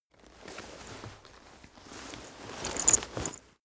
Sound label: leather metal handling long